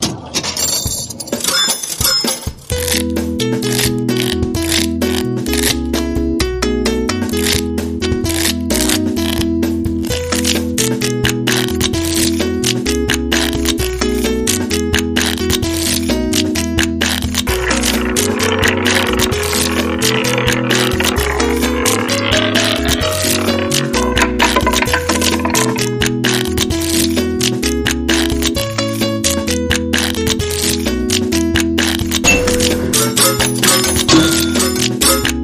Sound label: Deck The Casino Halls; Trad (PD)